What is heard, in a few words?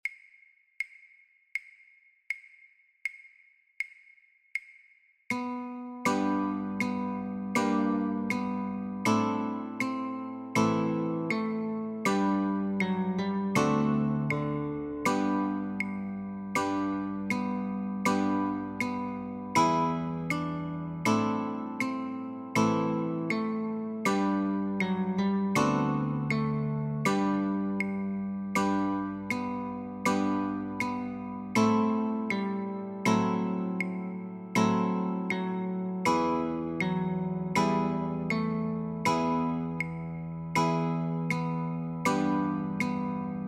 Notes & Chor, guitar; Oh Little Town of Bethlehem; christmas